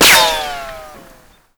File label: helmet ricochet